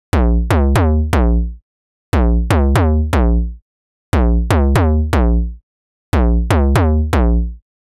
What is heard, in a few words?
Loop Bass 120 E, Deep House; Cony; Ghosthack; Advent Calendar 2019